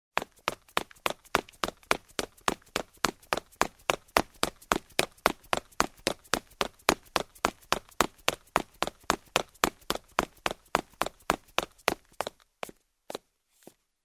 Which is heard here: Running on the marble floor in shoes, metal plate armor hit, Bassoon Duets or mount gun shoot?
Running on the marble floor in shoes